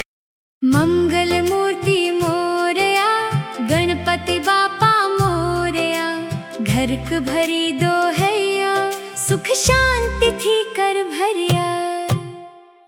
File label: gujarati mangal murti morya, ringtone; ganesh